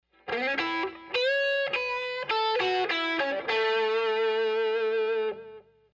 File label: Lead TS 9 with Delay